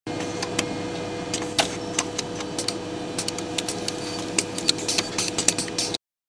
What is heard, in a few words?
Urine Analyzer Runs, hospital